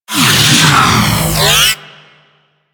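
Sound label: Grenade Launcher Projectile; Warframe; Weapons; ThanoTech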